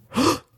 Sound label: gasp male